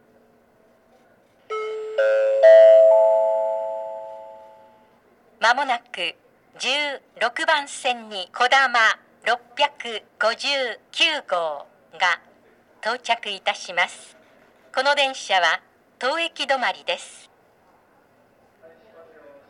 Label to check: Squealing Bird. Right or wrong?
Wrong